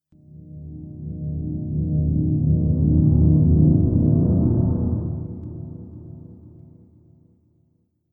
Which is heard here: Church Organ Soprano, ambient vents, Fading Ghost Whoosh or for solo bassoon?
Fading Ghost Whoosh